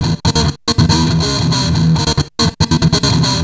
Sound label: Treat Guit, Industrial Nasty